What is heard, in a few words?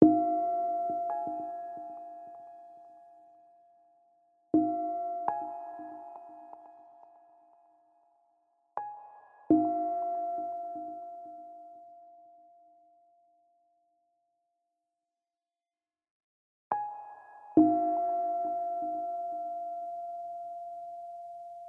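Village Bell music; the unfinished swan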